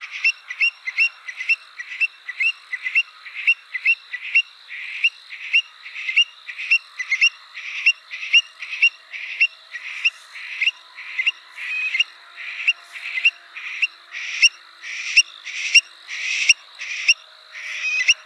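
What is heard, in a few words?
call, Stone curlew, strangled call; the last syllables of the recording: they still have the rhythm of gallop call, but the sound is almost completely strangled; Burhinus oedicnemus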